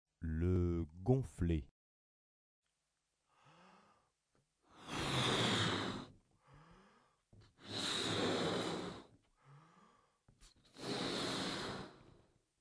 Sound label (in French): gonfle